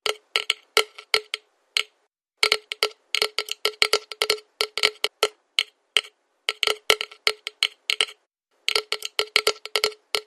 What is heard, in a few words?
Sting Electrode Avalanche